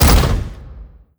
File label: step approach, dog; npc; scripted